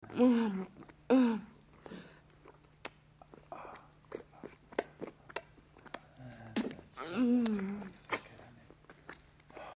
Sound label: suck, moans; The Witcher Hunt